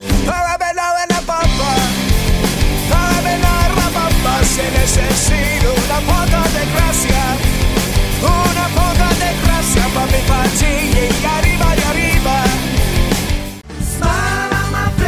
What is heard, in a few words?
animation men singing; voices men